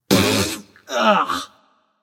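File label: nonverbal clear throat; smite; voicepack; merlin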